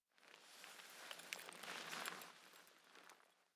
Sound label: gear movement long slow; Rust